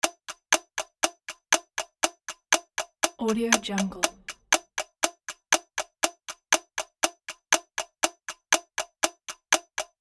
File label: ticking clock